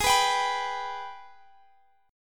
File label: G sharp Minor Major 7th G sharp down; G#mM7 strummed